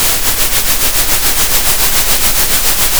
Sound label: pop, Sound of a train